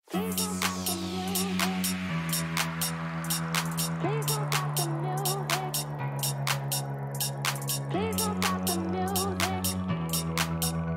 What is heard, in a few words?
remix bass boosted